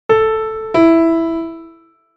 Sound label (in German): Intervalle sukzessiv